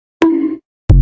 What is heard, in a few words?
donk